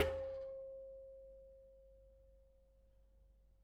Bicycle Spoke

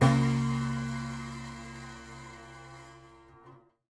Prepared Piano

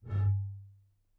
Bottle Blown

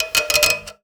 Classical Guitar Extended Technique

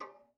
Ping, Kitchen Toaster

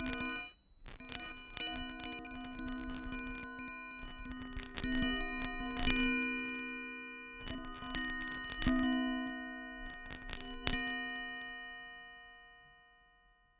Baoding Balls

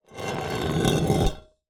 Drag, Vase

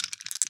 Marbles Rattle

